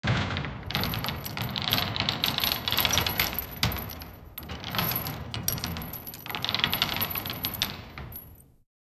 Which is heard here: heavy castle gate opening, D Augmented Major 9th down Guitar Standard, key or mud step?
heavy castle gate opening